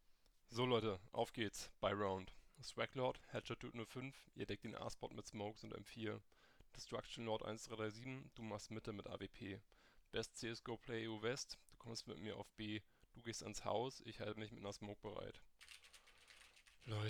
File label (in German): Testaufnahme SteelSeries Arctis Prime Klinke